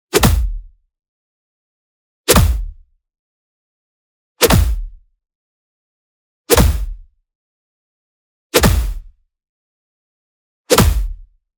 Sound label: PUNCH Facelift; boom library; Anime Essentials